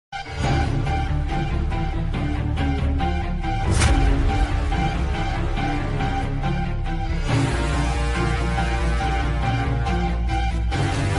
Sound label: background music